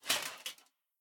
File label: armor heavy; Smite; god Lancelot